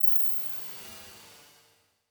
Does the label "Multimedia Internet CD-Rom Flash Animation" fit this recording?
Yes